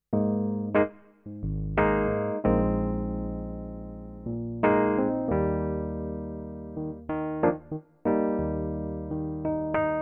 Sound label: Epiano